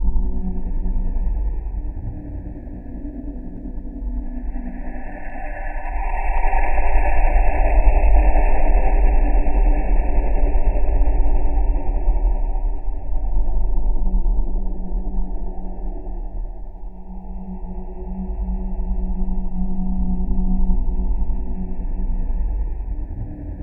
SUSP AMBNCE

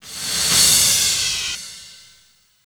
CRASH -L, Drum & Bass; Ueberschall; Drum & Bass Resonance

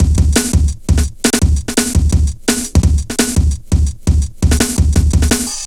Steppa, Jungle, Drum Loops 169.5; Zero-G Jungle Warfare 1, 2 & 3

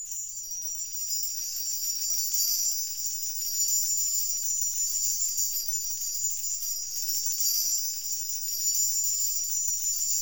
BELLTREE

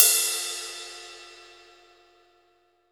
RIDE, ROCK RIDE CYMBAL

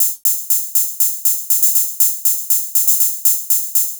NRG Cymbal

